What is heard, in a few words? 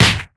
hit; Mole Smash